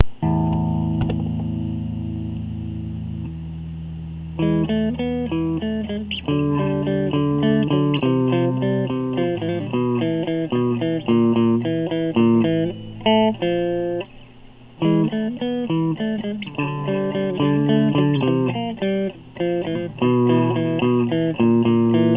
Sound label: Guitar Playing